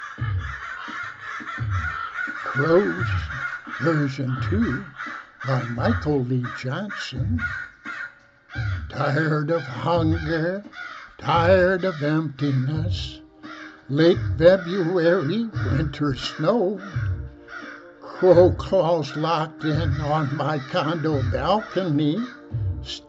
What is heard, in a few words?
Crows Loud Crows